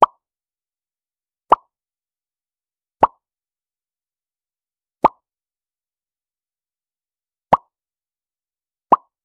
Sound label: pop; domestic